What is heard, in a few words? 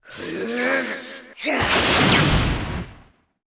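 sorcerer attack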